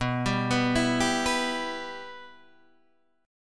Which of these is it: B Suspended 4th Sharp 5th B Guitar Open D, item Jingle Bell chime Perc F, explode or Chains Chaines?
B Suspended 4th Sharp 5th B Guitar Open D